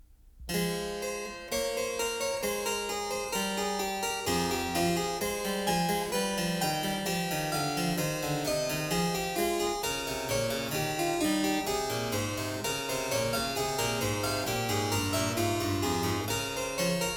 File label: prelude; BACH; WTC 1; Prelude 14; BWV 859